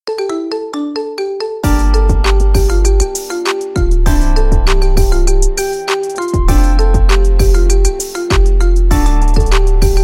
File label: marimba remix; ringtone